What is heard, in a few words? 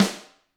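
GRETSCH snare rim, Drums; ILIO; Double Platinum Drums 1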